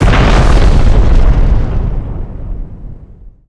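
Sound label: exp large generic, explosion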